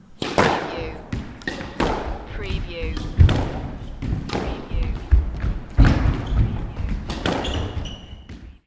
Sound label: Sports Squash Rally